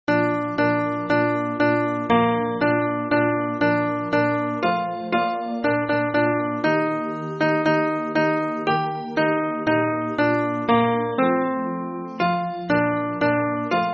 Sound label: hymn, alto